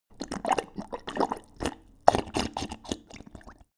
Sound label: dog drink break